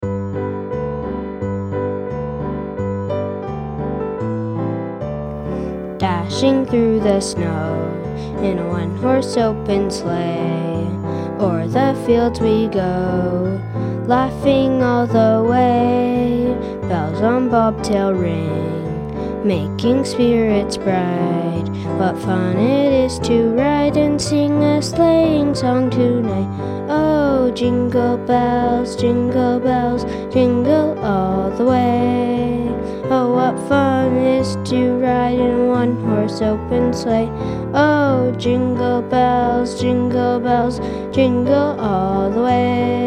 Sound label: Jingle Bells